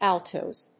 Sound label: Altos